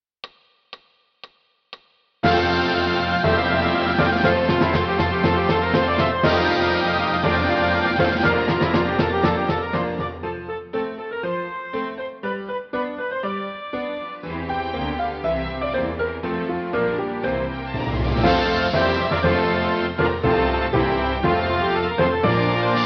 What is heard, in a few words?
Piano orchestra; Joy to the world